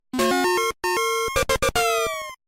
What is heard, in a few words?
Score a Point; Bo Jackson: Two Games in One; GB